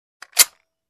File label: Machine gun reload